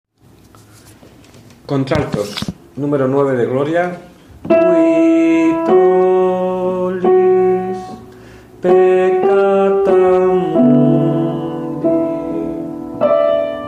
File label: alto; Qui tolis